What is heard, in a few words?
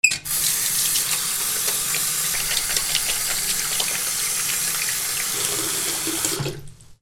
BATHROOM